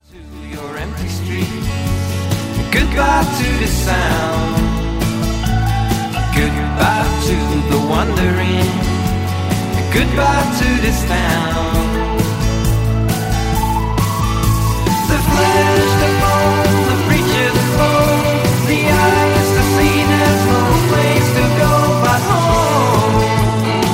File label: Howling wind